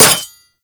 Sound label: Dagger Metal Impact; Dual Daggers; Hit Effects